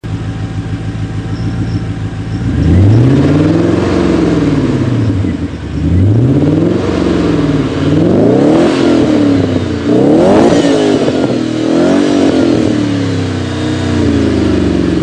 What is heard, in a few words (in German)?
S6 BN Pipes Auspuff